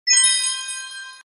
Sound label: Sfx Region Unlocked